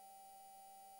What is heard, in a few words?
mic, mars2020 edlcam